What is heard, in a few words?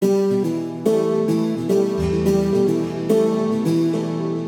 Rousing Guitars - Emin 107 bpm; MIDI Clips; Tonal; Motifs; Ableton; Mood Reel